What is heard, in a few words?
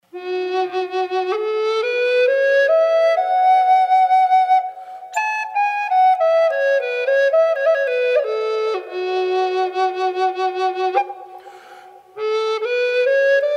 F-sharp Port Orford Cedar Wet, flutes